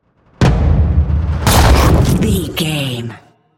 double hit whoosh shot explosion

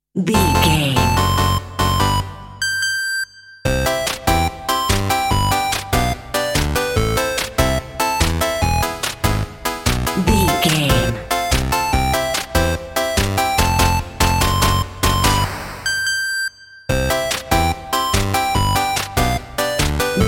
8 bit arcade game theme

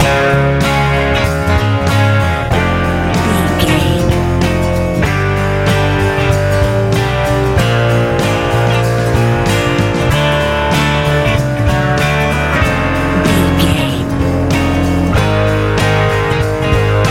med rock

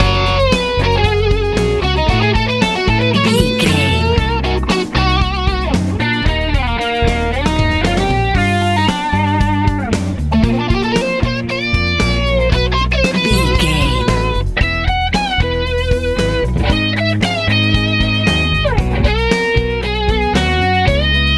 bright back champ life easy pop

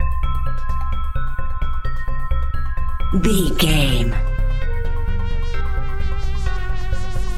80s synth sci fi loop

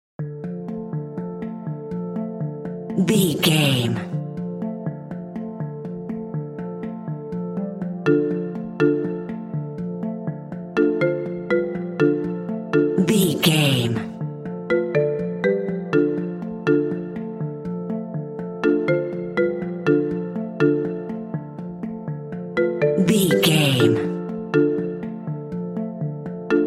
jungle marimba

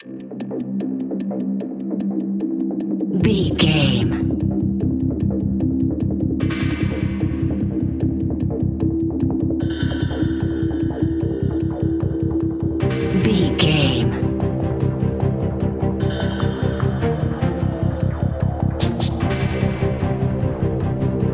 suspenseful ticking

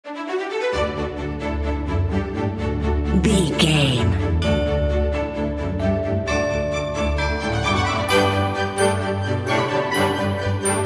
joyous occasion

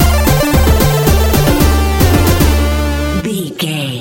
retro 8 bit game game over

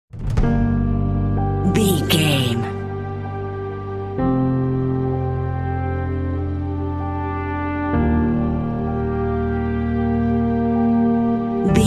hybrid orchestra trailer

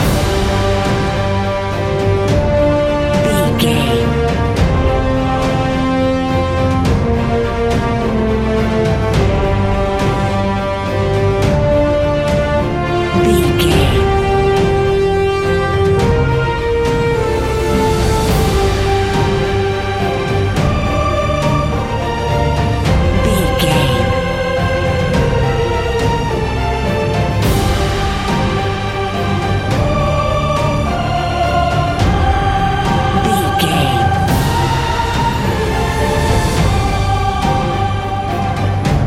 epic cinematic loop

